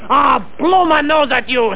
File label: blow my nose